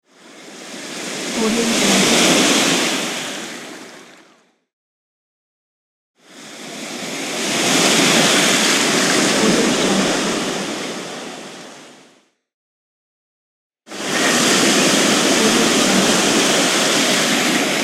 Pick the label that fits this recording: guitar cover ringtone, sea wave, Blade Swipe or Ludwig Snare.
sea wave